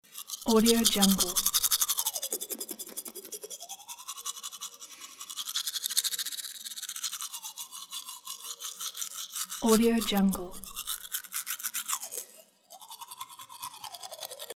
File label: brushing teeth